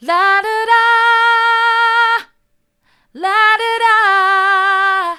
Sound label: la do da mid, vocal